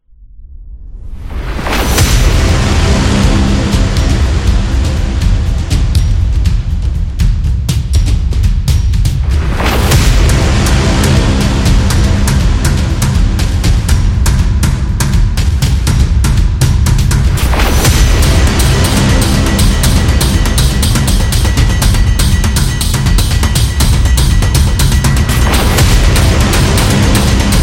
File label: Epic Drums Action, epic war drums background music